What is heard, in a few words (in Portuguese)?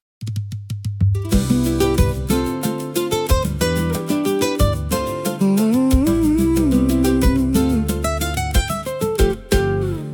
Samba Gafieira